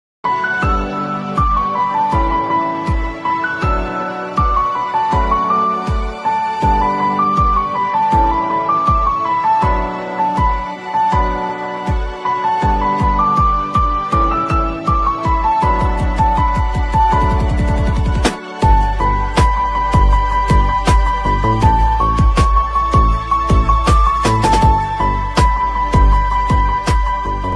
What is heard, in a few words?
bgm, telugu ringtone, tamil ringtone; taxiwala movie